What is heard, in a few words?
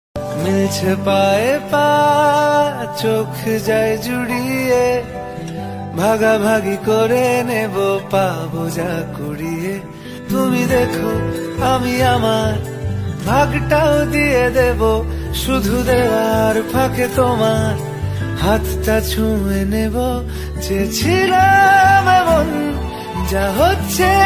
Bengali Ringtone